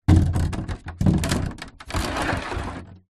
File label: foley water cannister plastic 10 ltr movement concrete ground; zapsplat